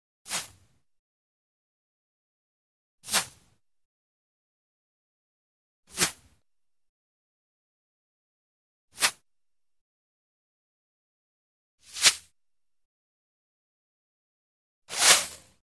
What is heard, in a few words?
Arrow Sizzle Bys Light Arrow By Fast With Light Whoo; Hollywood Edge Foley Sound Library